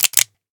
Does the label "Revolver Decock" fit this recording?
Yes